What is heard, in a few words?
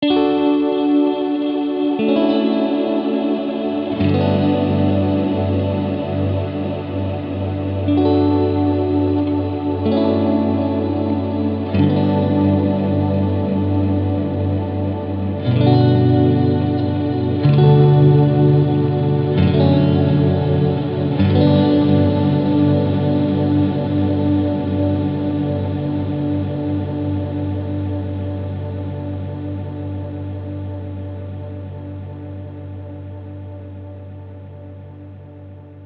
12bit